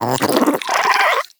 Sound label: Vocal Idle, Hunting Critters; Nexifera